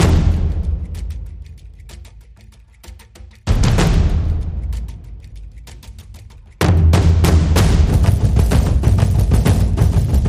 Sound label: Hunting Grounds, Percussion